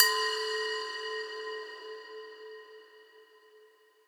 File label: Impact